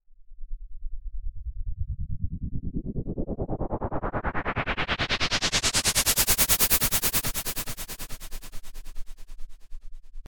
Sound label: Pink Noise Effix Delayed; Techno